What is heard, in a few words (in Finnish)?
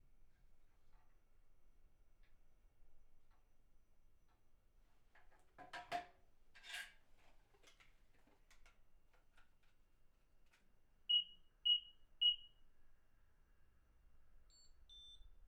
halytysjarjestelman ohjelmointi piippaus; sector alarm